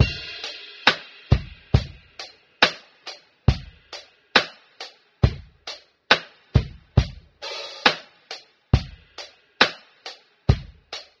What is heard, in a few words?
lingering funk, Drum loops Boombap